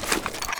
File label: reload round deploy, weapons